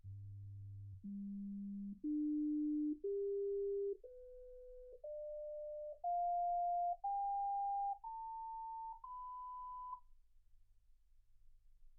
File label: sinus tones 48kHz